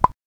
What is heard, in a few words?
bubble